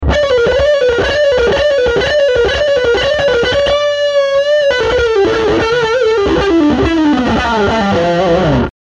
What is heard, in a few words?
Speed lick mid distortion